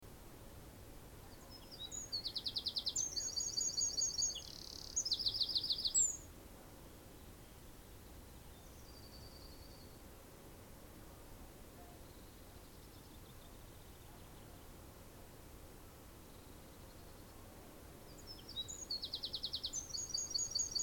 bird sings in the afternoon

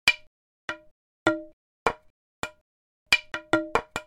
hitting a wooden pole

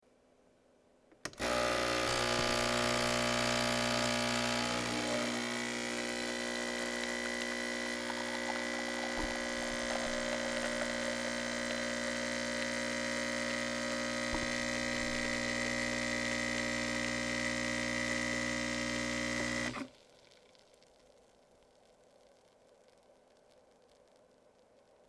espresso machine

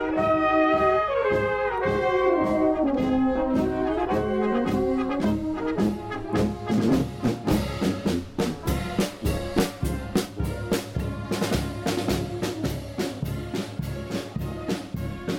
march band marschkapelle spielzug zieht vorbei; leverkusen

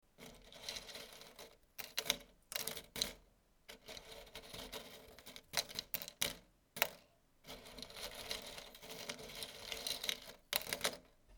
paperclips

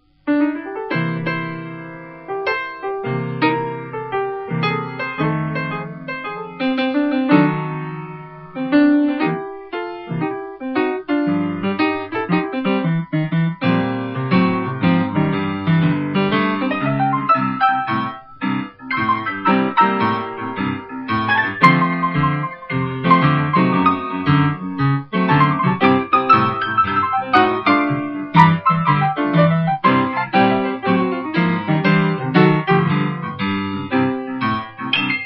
Blues, piano boogie

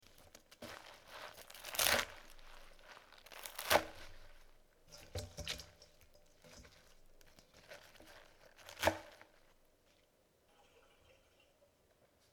cutting beet greens

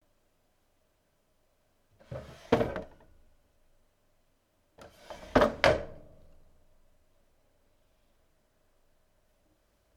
drawer

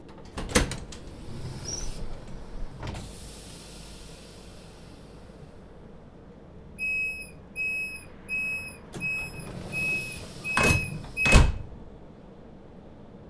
tram doors

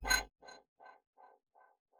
detach

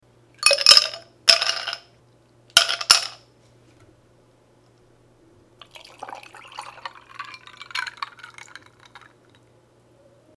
iced tea

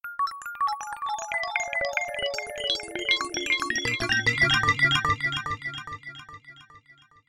glass arpeggio; arpeggios

